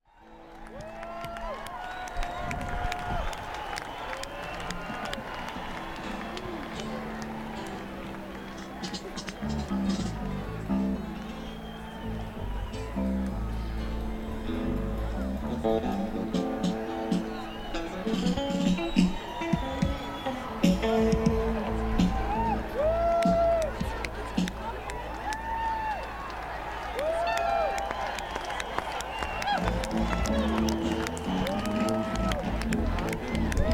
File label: Crowd Tuning